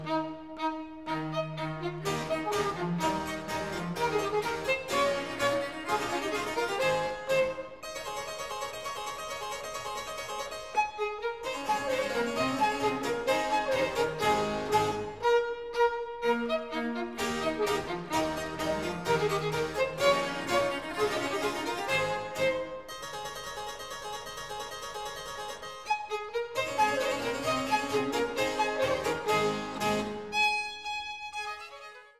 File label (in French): tambourins; Rameau; 3e concert; Warner Classics